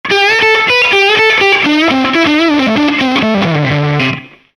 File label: Solo Rockin Hot, Guitar Effects Pedals; Pro Co